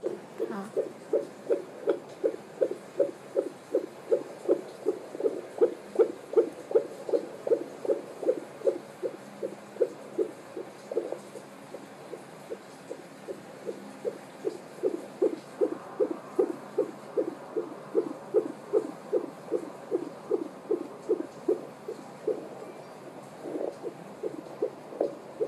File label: beat